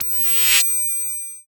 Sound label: interface beep, Sci-Fi Interface; BlueZone